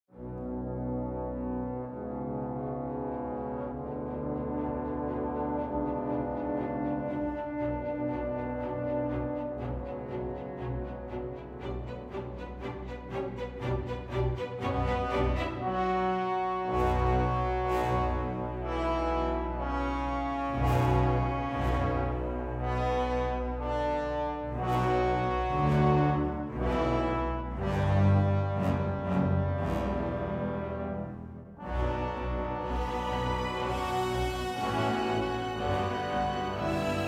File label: Character Introduction Music; Wyll